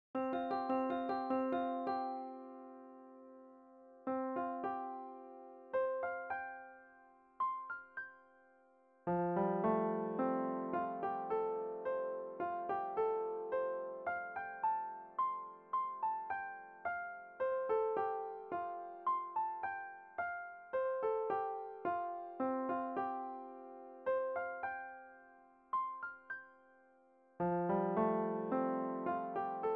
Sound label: with handbells